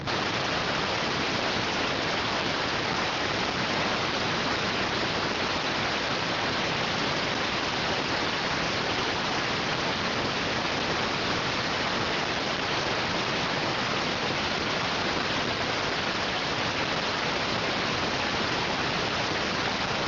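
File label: radioteletype receive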